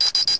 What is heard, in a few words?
triple beep, machines